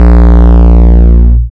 808 as clip

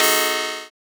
cymbal short stack, analog

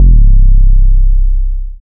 808 c slide

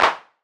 clap dirt, memphis dark

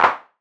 clap muffled, plugg airy

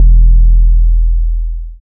808 sub, afro drill percussion

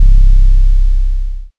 808 dusty, lofi

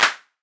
clap hard

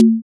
conga low

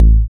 kick punch beater, trap

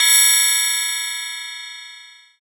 crash modal

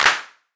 clap big, jersey club

